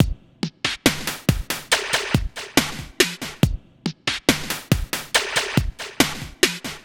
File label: SloMo Beats, Loops 140 BPM; Vengeance - Essential Clubsounds Vol.4